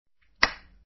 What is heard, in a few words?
fx down